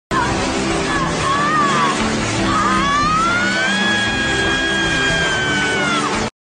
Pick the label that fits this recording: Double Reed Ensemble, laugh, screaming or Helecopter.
screaming